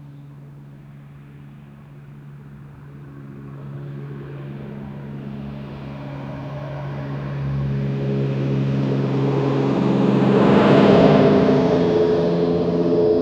golf r full, automotive; golf 6 r

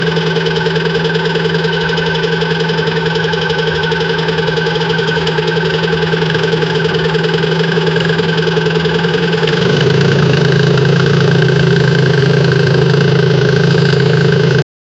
ferrari 812 superfast eng; automotive

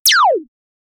single shot, laser

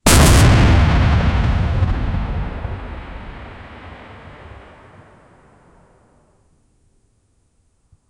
dynamic close up shot a d, nuclear blast

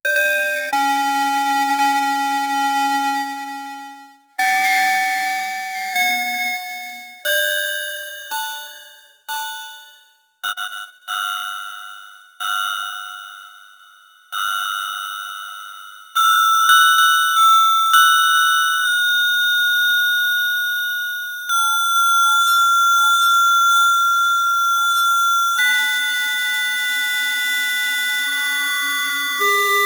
dialogue bitcrushed 8bit

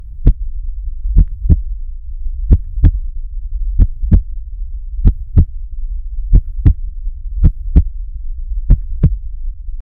slow heartbeat with deep

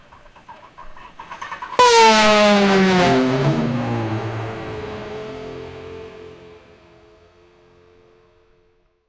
fn scar single shot, guns

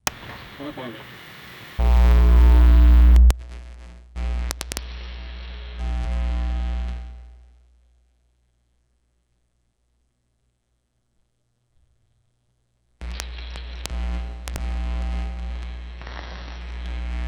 short circuit, electricity

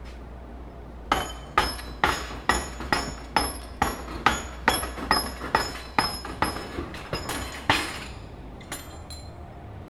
builders are laying brick, construction

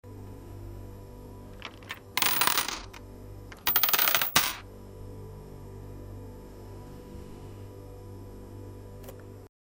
money deposit sound

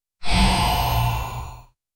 dark silent taper off, darkness

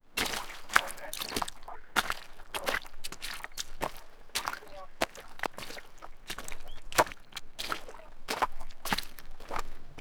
walking on shallow water, footsteps

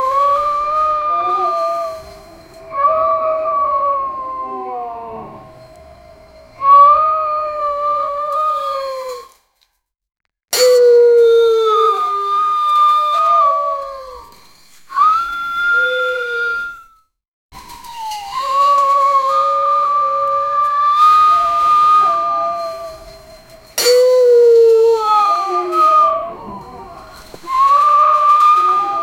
grey wolf gets hit in, clang

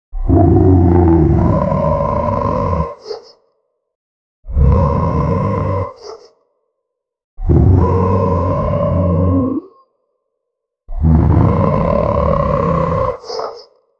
growl a fantastic predato; animal

